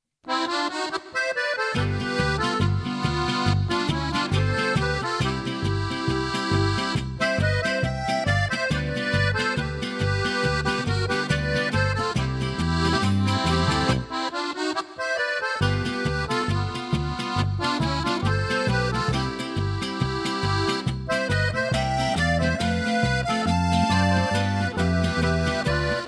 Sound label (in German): Akkordeonspielereien, Polka